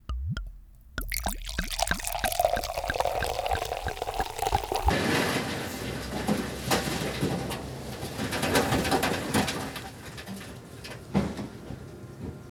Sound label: soda dumping; fftsplice